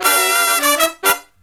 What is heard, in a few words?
Brass Riffs; USB Soundscan vol.29; AKAI